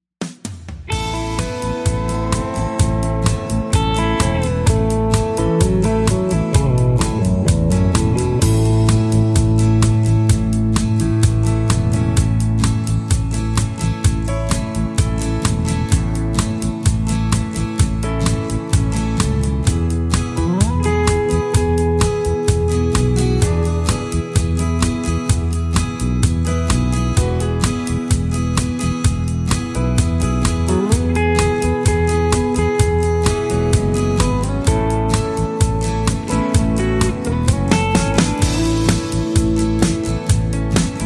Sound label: Instrumental with No Leads